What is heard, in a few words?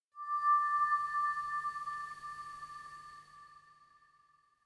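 electronic firefly light; anemoi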